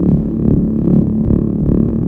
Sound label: relay signal activated loop